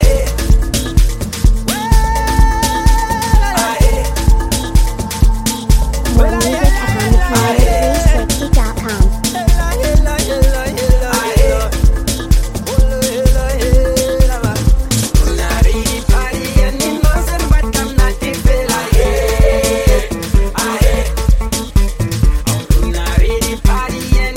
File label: amapiano